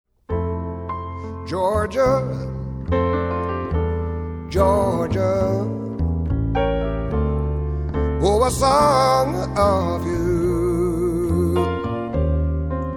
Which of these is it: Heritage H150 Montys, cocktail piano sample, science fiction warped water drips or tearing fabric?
cocktail piano sample